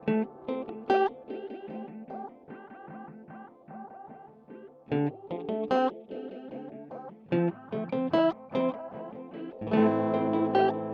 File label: Meris Polymoon Phaser Flanger